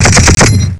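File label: machine gun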